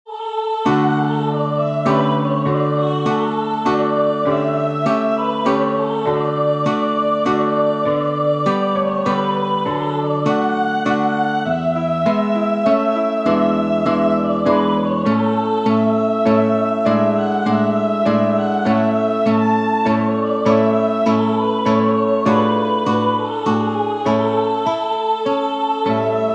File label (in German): Kuhreihen, alphorns